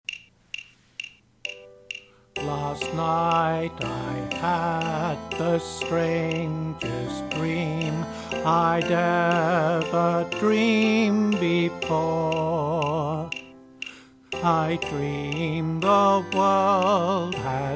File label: bass vox